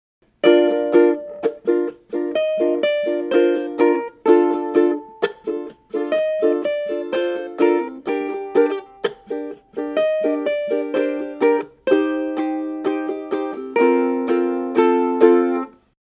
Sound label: Chorus Riff, ukulele